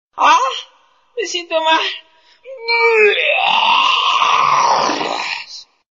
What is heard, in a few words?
Vomiting, Funny Ringtone